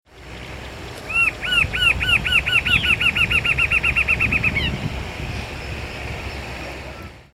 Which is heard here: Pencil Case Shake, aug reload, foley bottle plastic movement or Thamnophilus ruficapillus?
Thamnophilus ruficapillus